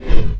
wep lance; massassi

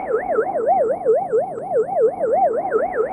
lava mining hover

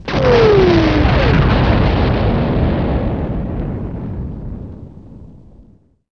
explode xwing

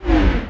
hack; executioners hack

